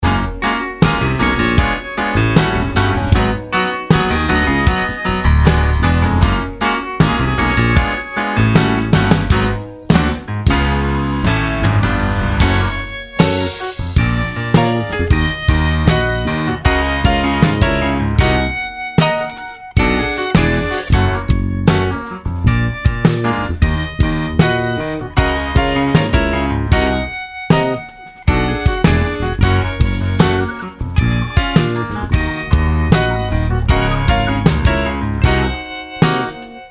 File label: Ringtone Instrumental